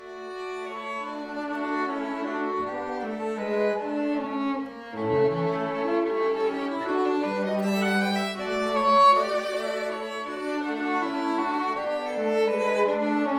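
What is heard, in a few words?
string quartet D major; asplmayer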